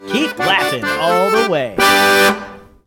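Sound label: Keep Laughing All The Way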